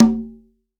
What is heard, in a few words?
CONGA, STEREO CONGA